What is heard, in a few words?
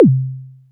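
MISC Right, Techno 138 BPM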